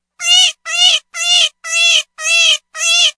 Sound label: Frightened Cottontail